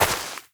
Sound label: step, footsteps player grass